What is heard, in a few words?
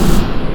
DRUMMM FREAK, DRUMS + PERC; Rubber Chicken Software Sample Bank